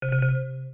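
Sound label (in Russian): отказ оборудования системный звук Windows XP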